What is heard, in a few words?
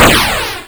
kaboom; countdown mayhem